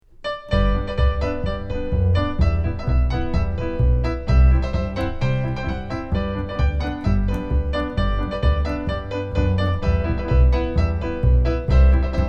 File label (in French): Irish music piano contrebasse